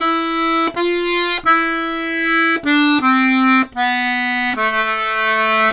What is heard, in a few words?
Complete Accordions; USB Soundscan vol.40; AKAI